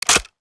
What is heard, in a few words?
open; tacz; daffas arsenal